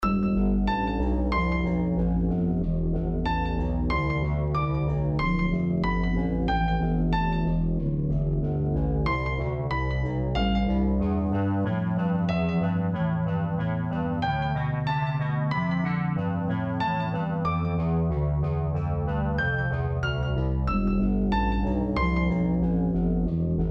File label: bgm bad end